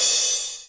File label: Drum Finish